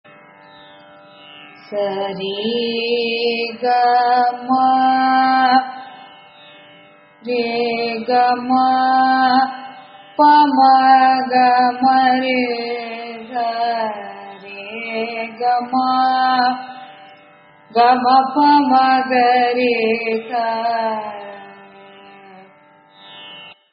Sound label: s r3 g3 m1, carnatic music